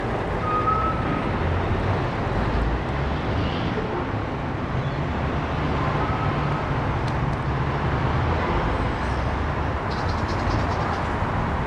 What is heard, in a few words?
lake bin chicken; Coburg Lake; Coburg Lake 2026